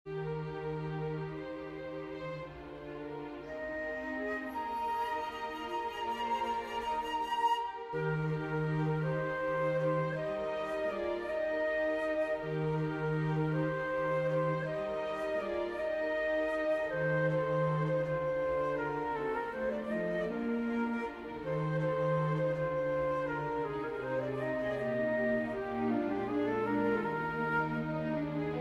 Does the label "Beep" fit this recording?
No